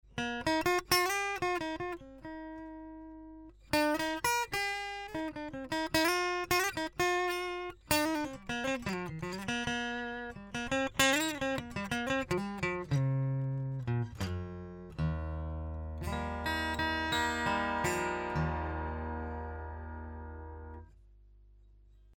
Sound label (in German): 114ce DI Melodien und Open Chords; LTD